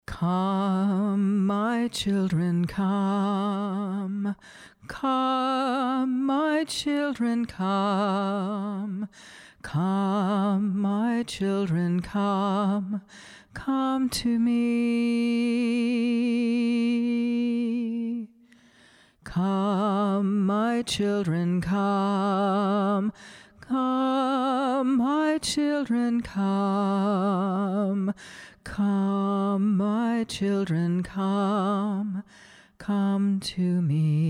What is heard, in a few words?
tongues song